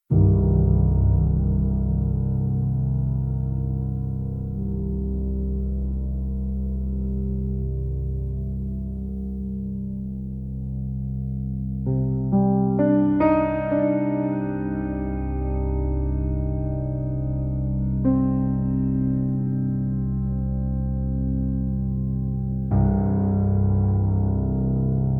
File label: psychological dark ambient quiet restless